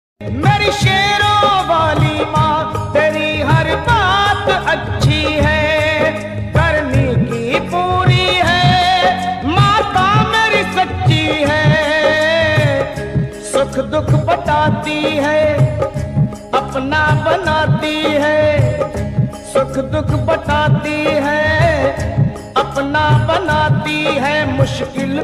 bhakti ringtone; navratri special

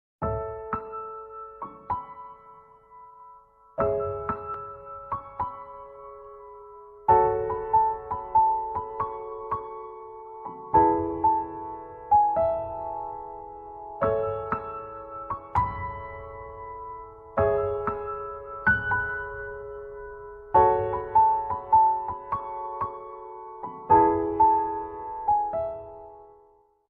sad bgm love feeling; adithya varma

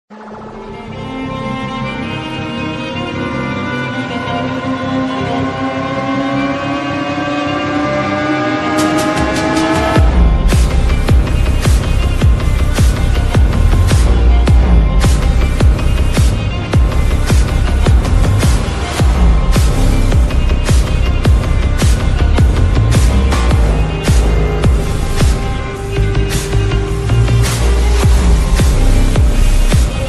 slowed reverb ringtone, lofi; pirates of the caribbean; captain jack sparrow

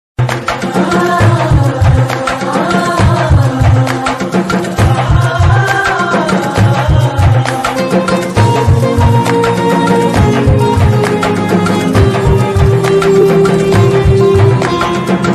sad bgm, love failure bgm, sad ringtones; vaaranam aayiram

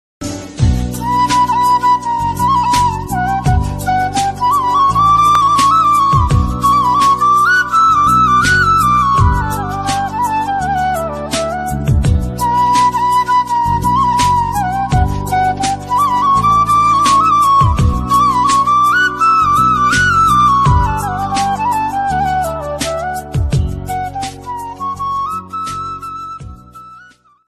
love bgm flute music tamil

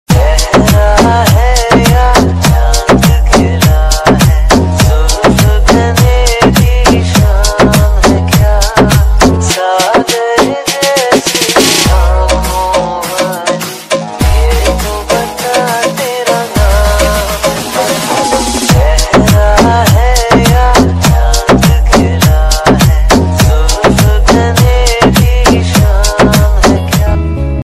hindi ringtone love ringtone flute ringtone

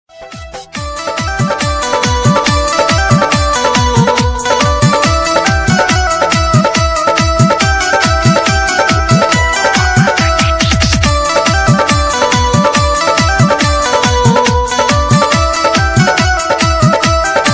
incoming call ringtone; durga puja ringtone; durga maa; prince babu

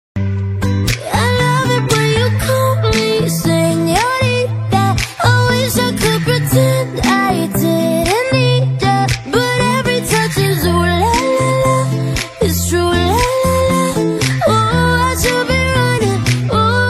lyrical female version song ringtone

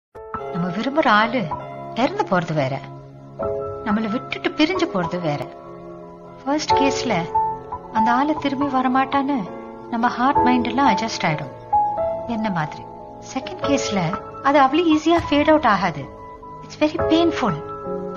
sad love feelings

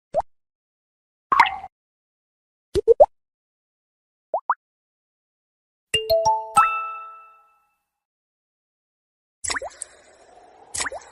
water drop message tone iphone ringtone

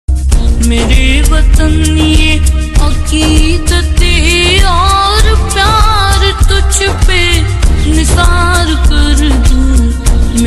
desh bhakti ringtone; 15 august special ringtone

